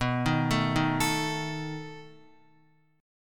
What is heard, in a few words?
B 7th B down Guitar; arpeggiated; JGuitar